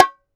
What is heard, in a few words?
BONGO HI OP; CUBA